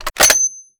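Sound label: sniper ads rechamber; gmod; iw8; alpha50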